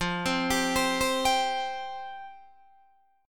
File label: F Suspended 2nd F Guitar DADGAD